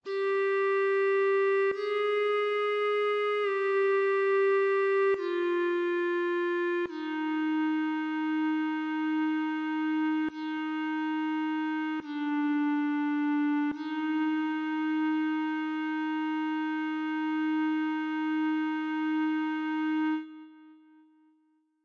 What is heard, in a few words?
alto